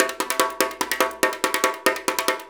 PRC HAND, Africa, Perc Loops; Roland LCDP14 Africa VOL-2

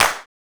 SNAP CLAP; Drums In Your FACE!; CLAPS

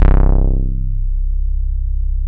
TOWN BASS, Bass Analog & Synth; AKAI; USB Soundscan vol.12

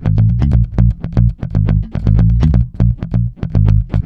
A#, groover, bass; Spectrasonics; Bass Legends

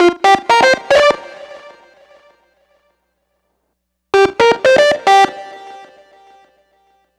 Grunge Lead; Dr. Fink's Funk Factory

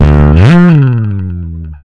FUZZ SL, Bass Legends, SLIDES

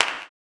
CLAP, blues & soul; ILIO; Vocal Planet

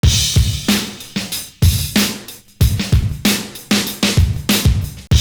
ROCK 80 S; Univers Sons

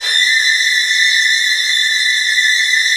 VN TRILLS, Virtuoso Strings

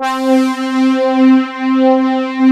HORN STR; Pro-Rec - Synthsonic; JV ANALOG

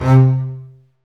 STR, STRINGS; Roland - LA Composer vol. 1

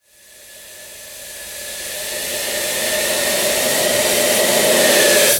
Sweeps Up, Effects

